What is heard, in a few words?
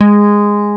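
PICK G#3, FRETLESS BASS; Northstar - New Gold VOL-2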